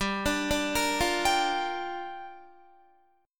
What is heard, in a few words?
G Minor 7th, Guitar; arpeggiated; 4F,4A,5C,5G,5C,6E; Gm7; 14,17,14,15,17,15